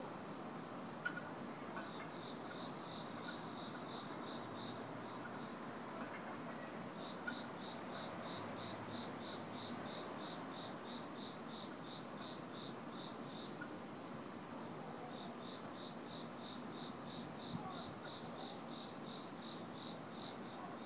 night musik

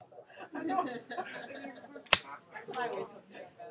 clap

